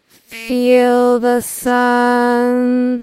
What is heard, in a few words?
singing